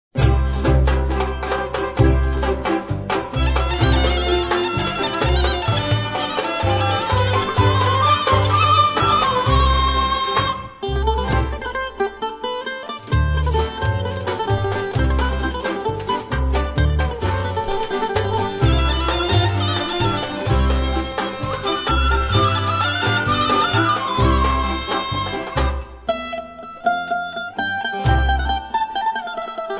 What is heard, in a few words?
Melodies for Tambura